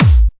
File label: Clubby Kicks, Bassdrums